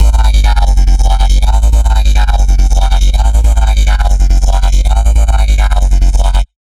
Bassline A2 140, Dubstep; GhostHack

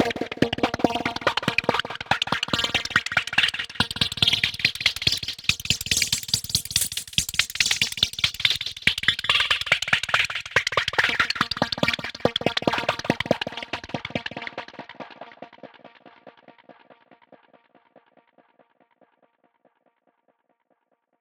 Lead Line A# 142 BPM, Psytrance; Lead Lines; Luciole

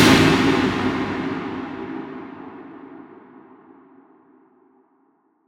Noise Impact; Psytrance; FX

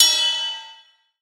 Tonal Ride, Cymbals; House; Drum One Shots; Cymatics

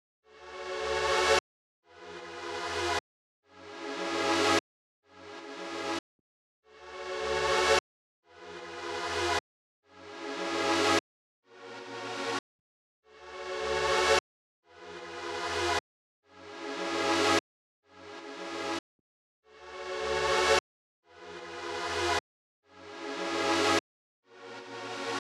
150 BPM F# Min Reverse, Future Bass; Melodies; Loop Stems; Cymatics; GHOST (Beta Pack); Believer

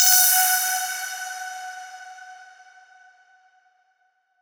Fx 130, Techno; Loops; Ghosthack; Free Techno Sounds 2024

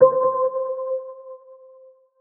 PLUCK Shine - C, Synth One Shots

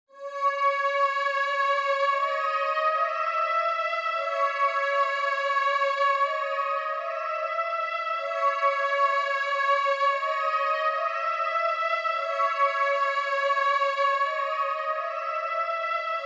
Lead, 120 BPM C# Minor; Cymatics; Neptune

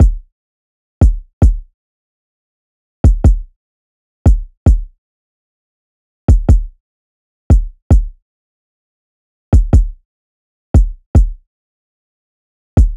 Drum Loop 148 BPM Kick; Loop Stems; Cymatics; DOPE DRUMS VOL. 1; Friday Drum Loop

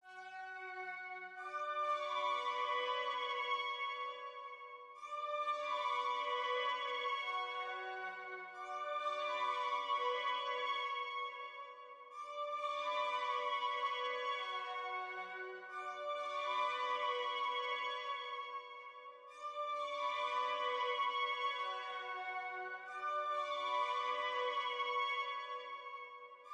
Synth, 134 BPM F# Min; Cymatics - 2022 Melody USB Expansion; Infinite

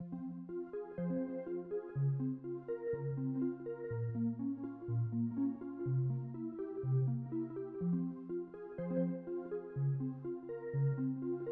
123 BPM F Min Bell, Hip Hop; Melody Loops; Loop Stems; Cymatics; Vuelve

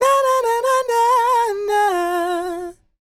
Ad Lib Dry, 140 BPM F Min, Vocal; Cymatics; Euphoria Vocal Sample Pack; Ad Libs

